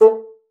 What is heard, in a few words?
STAF A3, BASSOON; Xsample VOL-6